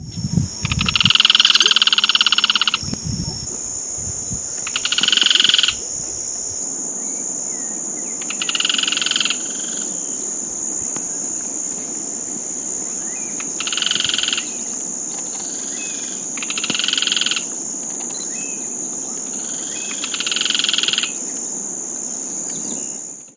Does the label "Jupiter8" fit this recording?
No